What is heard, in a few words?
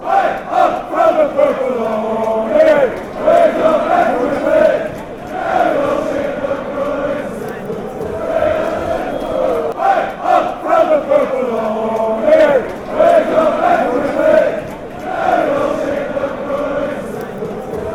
beerschot chant, fanchants